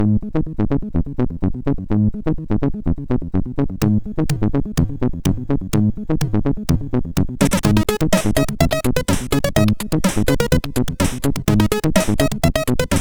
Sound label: Groove, sid MOS6581R4